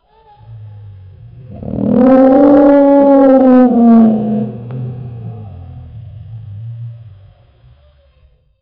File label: tyrannosaurus rex roaring in a jungle; dinosaurs